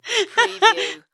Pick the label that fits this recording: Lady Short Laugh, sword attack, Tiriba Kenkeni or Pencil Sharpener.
Lady Short Laugh